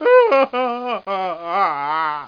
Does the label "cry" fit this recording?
Yes